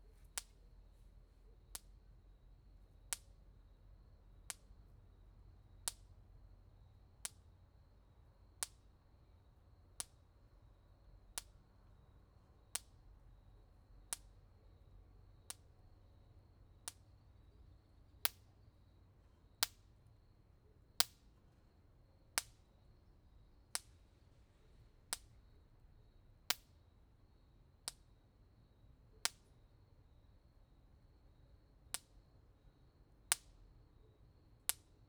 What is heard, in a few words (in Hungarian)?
villanypásztor; jaszbereny